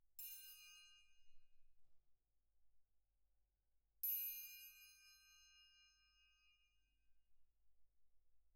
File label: Perc